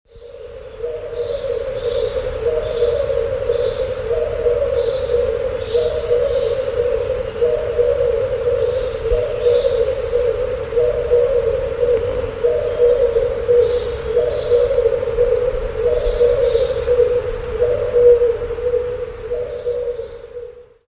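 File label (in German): käuze, Ostfriesland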